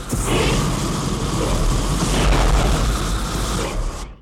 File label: flamethrower; alien-rush